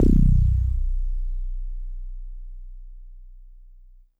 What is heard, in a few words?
Mono Bass DX100 B1